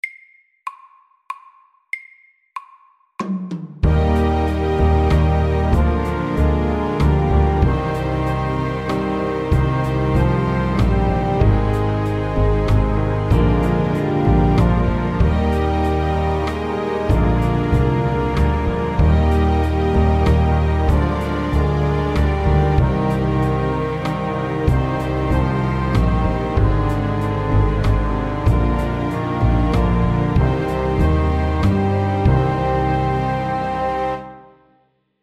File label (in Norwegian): Kveldsong, 95